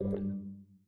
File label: press; Steam; tenfoot; txting